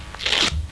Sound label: elk; Little Red Riding Hood